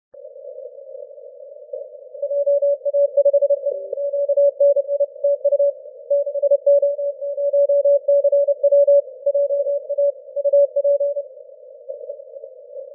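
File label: 30m continuous wave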